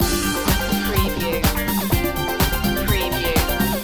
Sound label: disco loop